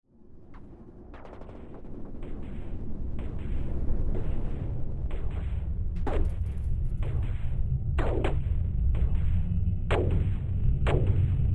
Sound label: Epic Sci Fi Battle; Science Fiction Sound Effects; star wars / trek style games